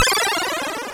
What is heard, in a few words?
falling; movement